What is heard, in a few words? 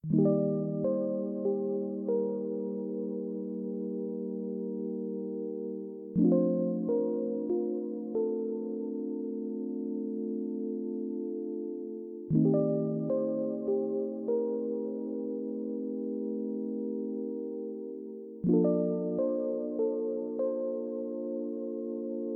Melancholy (Clean)